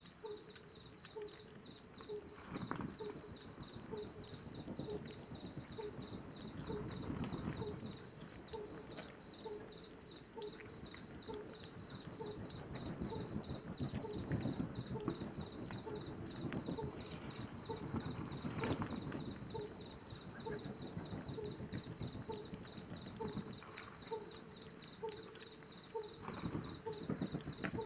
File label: Pallid Scops Owl Hooting of male with wingbeats of bats